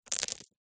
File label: pebbles small few drop low height soft surface; foley